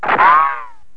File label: Boing; animwave